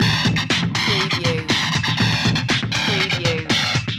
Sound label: remix loop